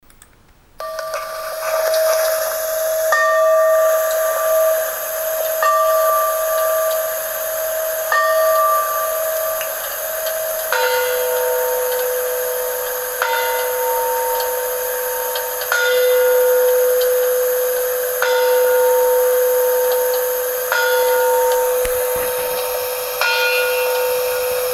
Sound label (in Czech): predhradi vezni hodiny